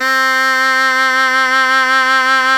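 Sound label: SHENAI, Shenai (Vib)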